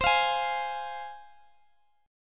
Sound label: C Suspended 4th Sharp 5th C Guitar Standard; strummed; x,x,22,22,21,x; Csus4#5 Chord; JGuitar